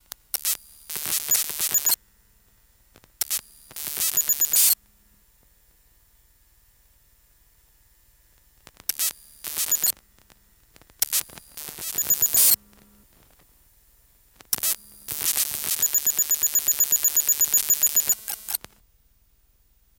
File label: Telemetry, fx & static